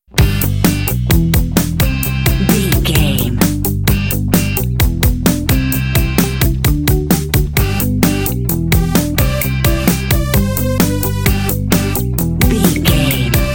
indy music cool

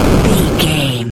retro explosion general

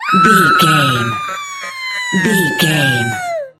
voice female scream

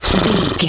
horse breath

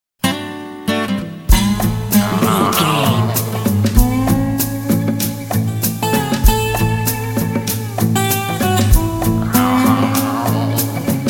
peppy blues slide

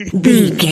voice female cough interrupt

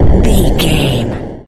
retro explosion deep

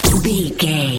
sci-fi weapon blaster laser boom small

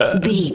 comedy burp

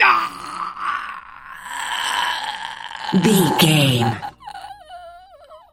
voice male b death low

